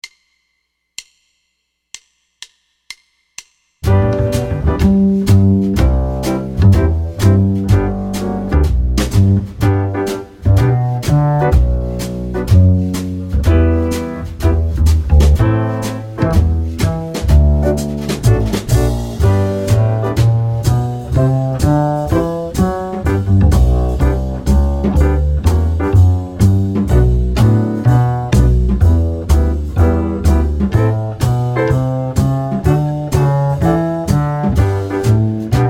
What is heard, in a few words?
swing piano; harmony hill